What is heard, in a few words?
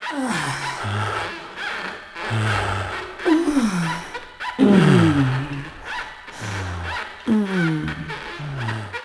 boot knockin